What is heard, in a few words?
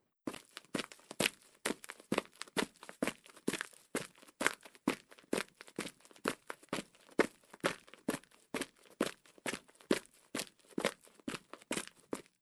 Footfalls Dry On Concrete; footsteps